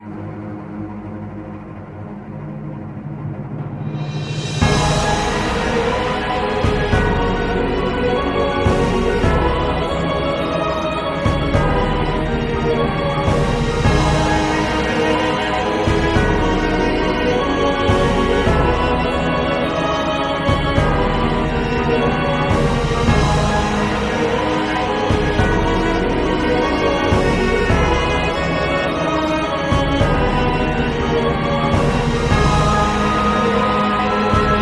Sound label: Cello Music; Confrontation